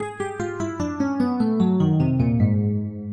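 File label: 12-tone row, Inverse; 3-intervals (2-3-4)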